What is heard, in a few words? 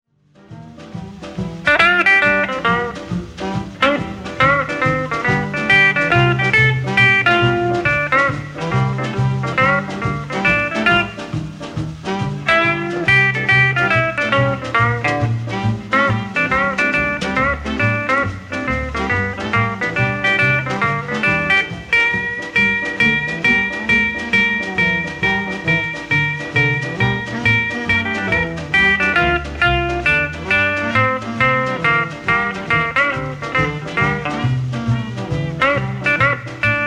Shuffle